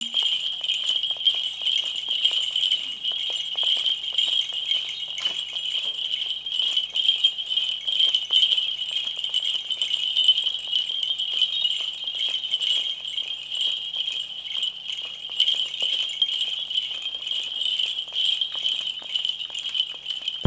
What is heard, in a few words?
Sleigh bells